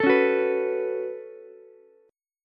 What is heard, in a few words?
Db 7th Suspended 4th Sharp 5th Db down Ukulele; strummed; chord shape; JGuitar